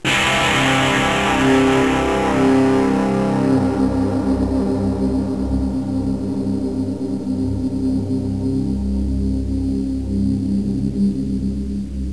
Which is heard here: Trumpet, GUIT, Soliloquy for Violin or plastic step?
GUIT